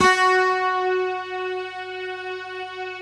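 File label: Bright Guitar Strings Stack; Optical Media International - Sonic Images Library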